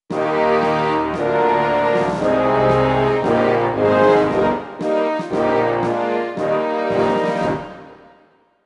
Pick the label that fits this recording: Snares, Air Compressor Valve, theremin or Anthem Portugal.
Anthem Portugal